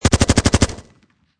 Machine Gun Heckler And Koch G36 Long Burst Close Perspective